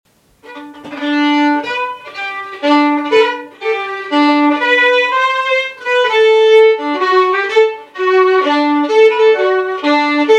waltz

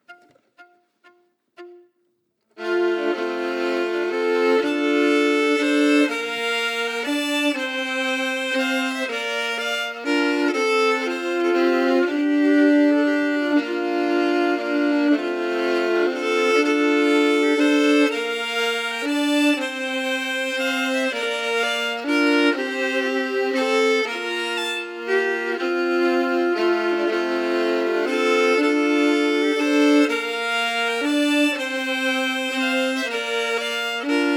Waltz

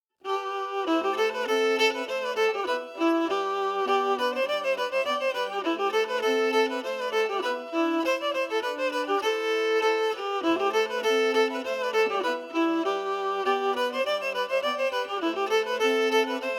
Sacketts Harbor melody emphasized

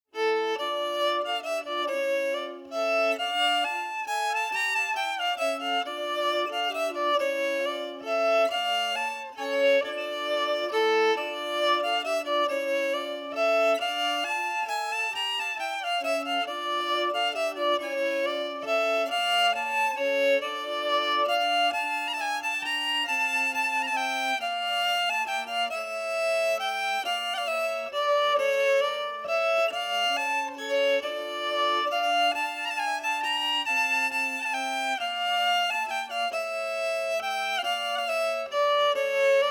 Vals melody emphasized; Vals efter C J Bjorklund

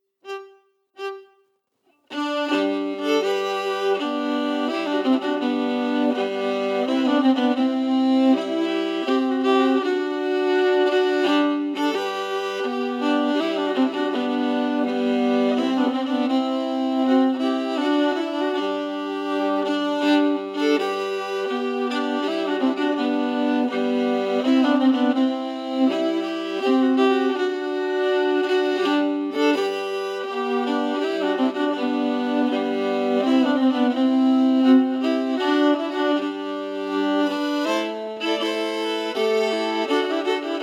Kry Pa Dig harmonies emphasized

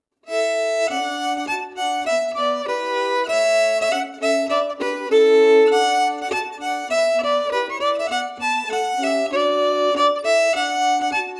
MacGregors March melody harmony

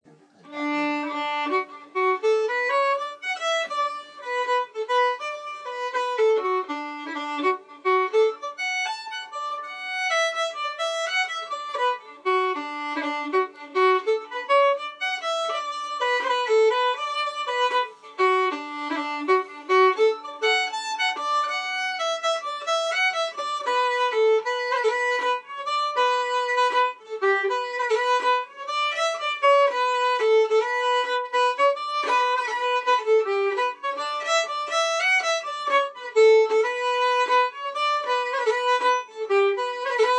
Burnt River Jig

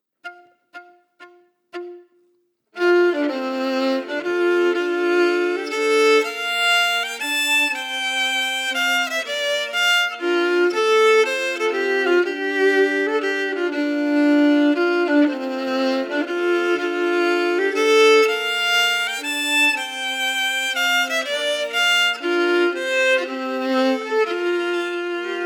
Waltz

